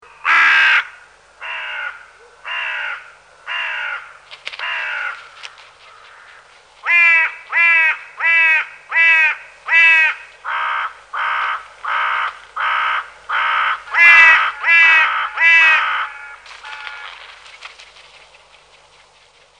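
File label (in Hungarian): Vetési varjú; Madarak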